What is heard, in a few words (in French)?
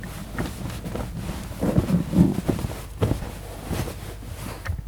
coussin